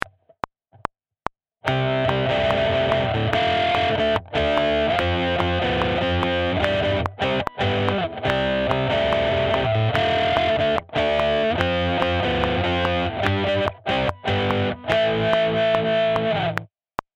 Beyond Powerchords

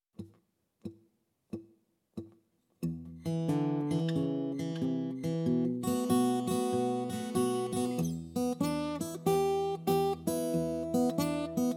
Open Tunings For Blues Guitar

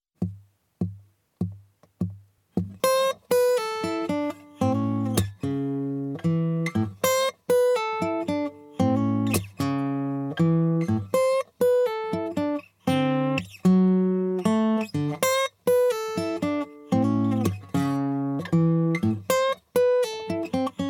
Blues Rumba guitar

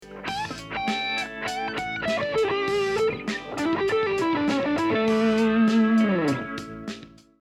backing track

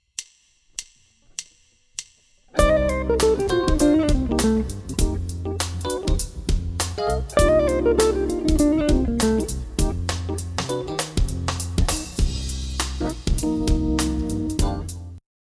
Dorian Lick

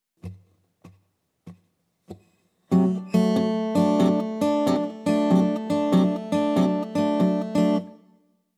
Open Tunings For Blues Rock Guitar

